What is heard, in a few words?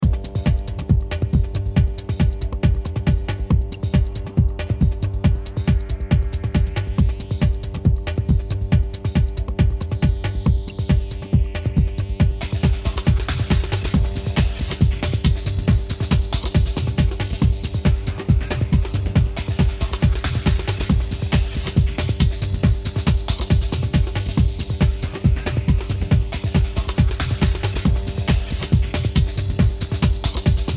bbeats 20bpm